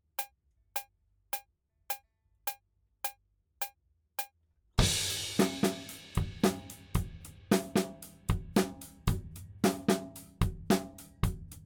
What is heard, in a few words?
Drums ONLY; Pre Pro